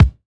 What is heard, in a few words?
focused bass drum one shot c key, kick single hit tuned to the C note